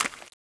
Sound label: zombie ghost footstep; ghostfury